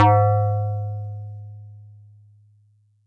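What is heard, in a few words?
Metal Tube DX100 G sharp 1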